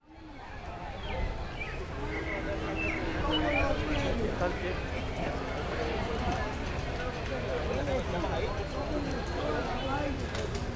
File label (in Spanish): marimba y ambiente